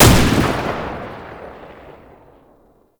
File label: Belt Fed Rifle Shot A; Warframe; Tenno; Frumentarius